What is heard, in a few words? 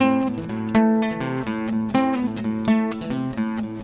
ACST GUIT ETHNO 125 A, Guitar; eJay